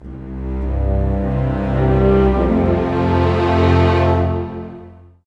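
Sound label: 108, orchestra; East West; Scoring Tools; Lonesome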